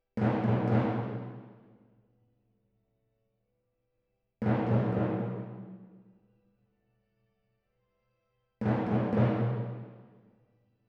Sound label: Timp